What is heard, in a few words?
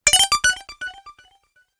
notification; OnePlus; One China